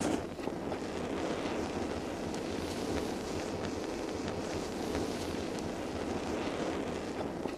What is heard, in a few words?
Flame Rvrs Loop, torches